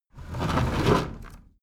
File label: window roller shutter close soft single pull